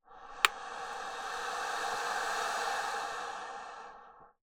Wind, Melodica

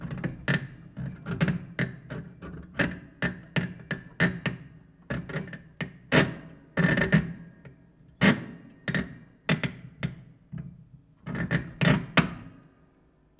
Plier Grind, Piano Destruction

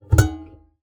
Classical Guitar Extended Technique

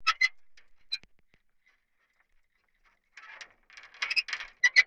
Sailing

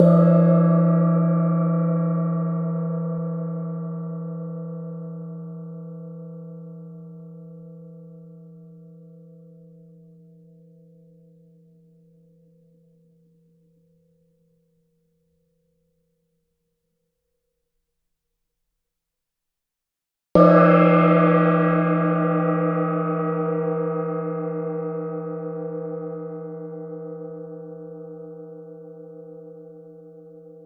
Small Gong; Percussion Samples

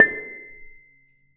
Bonang P, Gamelan

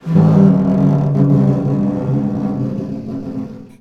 Snare Drum; Percussion Samples

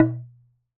Wooden Bar Strike

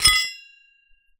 Hole Saw Rattle, Hole Saw Bits

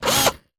Drill

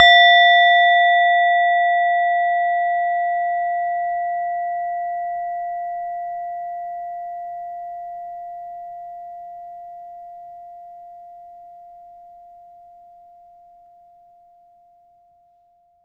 Hard Strike, Small Bowl, Tibetan Singing Bowls; Percussion Samples

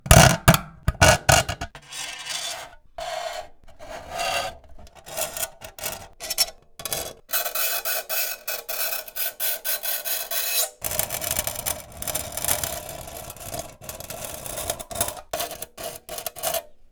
Beer Tin, Drinks Can

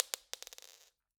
Drop On Floor, Medium Wine Glass

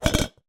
Ricochet, vase

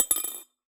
Drop In Glass, Medium Wine Glass

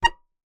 Melodica Short Note

